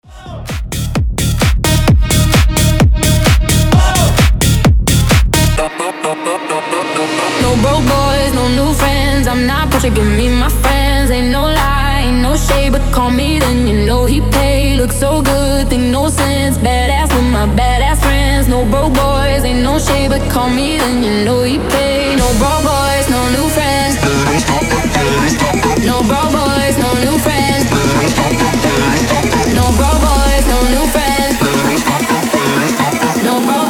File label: remix 130 bpm